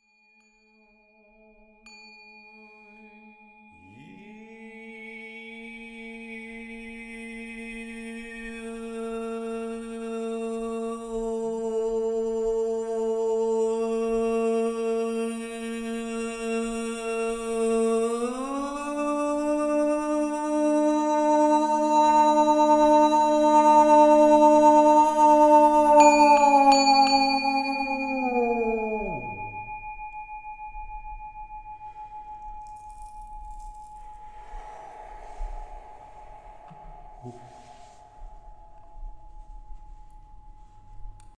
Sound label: Top O Cathedral Rock toning and bell